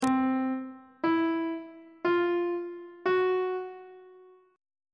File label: c dim4 perf4 aug4 intervals on treble clef